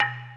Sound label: DING B4